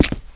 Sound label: FOOT, FOOTSTEP; Messiah